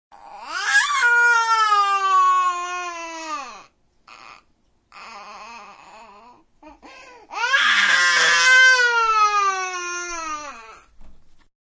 Baby Crying, High quality stereo recording of an upset baby crying, multiple yells, sobs and w; High quality stereo recording of an upset baby crying, multiple yells, sobs and whines.